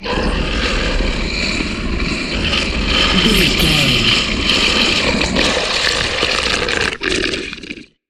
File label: troll monster growl long